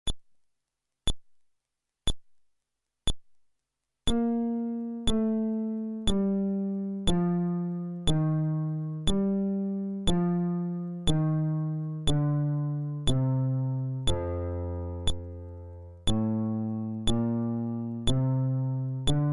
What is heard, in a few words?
Scale, fretless bass